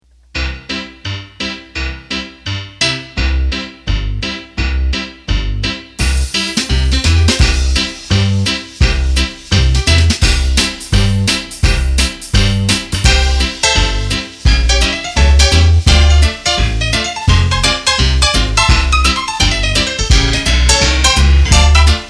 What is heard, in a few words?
Ragtime; roland bk7m